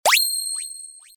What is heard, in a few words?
pikin